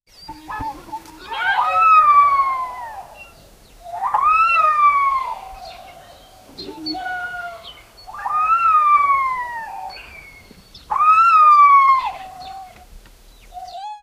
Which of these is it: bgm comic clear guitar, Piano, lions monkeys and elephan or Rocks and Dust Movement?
lions monkeys and elephan